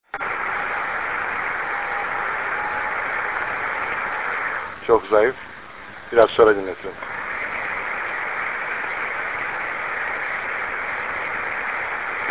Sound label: ITUPSAT BEACON; ITUPSAT-1; NA5B